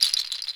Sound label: HUSK SHA, CAMEROON; AKAI S6000 CD-ROM - WorldSounds; CAMEROON PRC